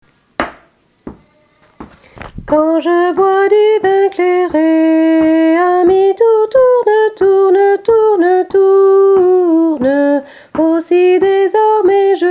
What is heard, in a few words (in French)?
Tourdion Soprano chant